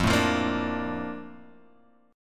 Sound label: Bb Major 7th, F